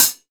airy foot cymbal a sharp key

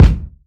kickdrum sound g key

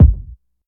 subtle reverb kick drum sound d key

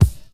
loud bass drum sound f key; kick drum samples

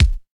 rich top end bass drum single hit c sharp key; kick

